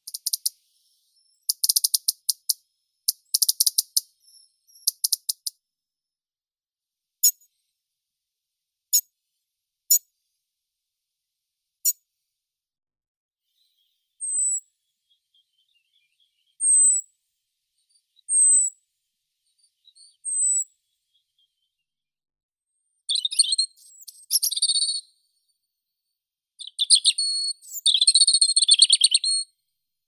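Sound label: robin Erithacus rubecula, nature sounds; malinovka zaryanka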